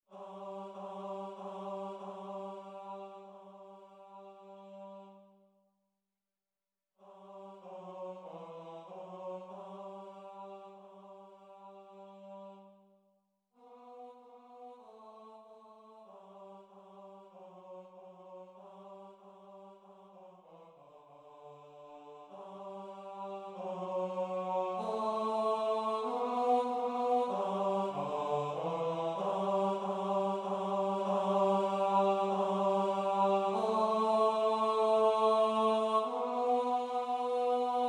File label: BARITONE; TTBB